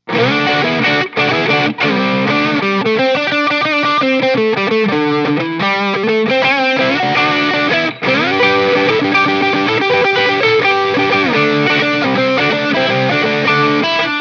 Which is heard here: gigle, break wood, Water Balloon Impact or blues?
blues